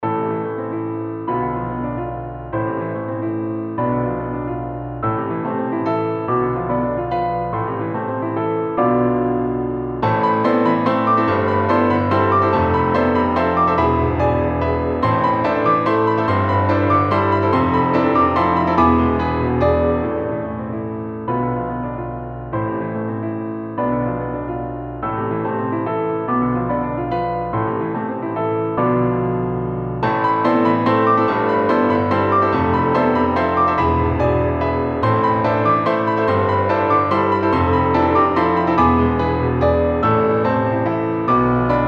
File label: all parts at tempo 144 bpm